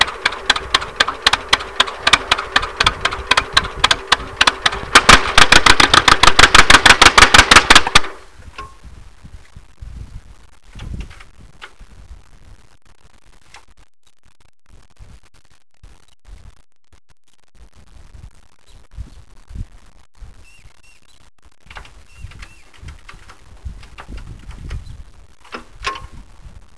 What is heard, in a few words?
stall, tractors; poland